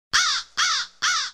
crow cries sfx

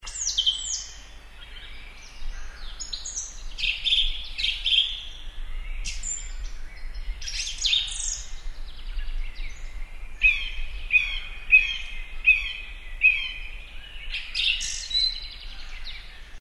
free birds chirping sound effect

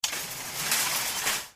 shopping cart or trolley push into others

